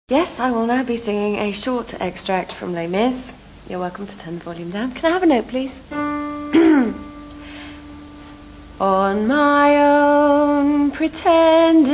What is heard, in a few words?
Singing; Les Mis